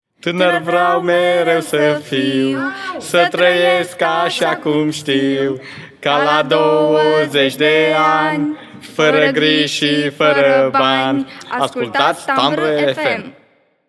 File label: Jingle; Europa